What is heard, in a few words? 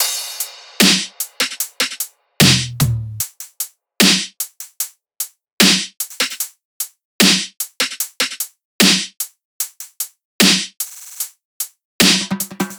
tops 150, Top Loops; Drum Sample Pack; SD SEISMIC; Slate